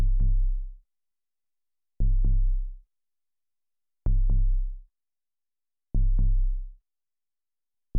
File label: Trance